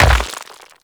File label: Rock Elemental Footstep Default; Warframe; Warframes; Brawler